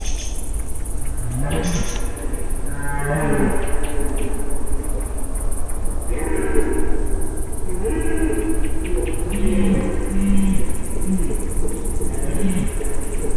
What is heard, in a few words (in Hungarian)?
három bika, gímszarvas; termeszetben